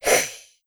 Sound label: naga hiss